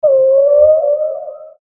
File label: humpback whale